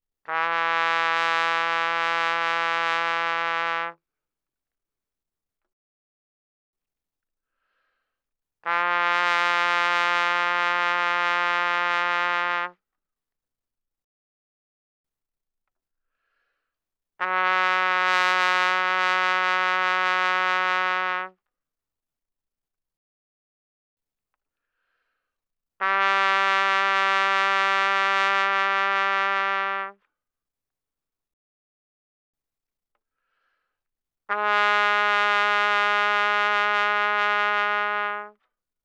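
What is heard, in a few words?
Trumpet vibrato ff E3 B3; Brass; Bbtrumpet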